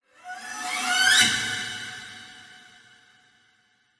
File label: horror accent scrape screech guitar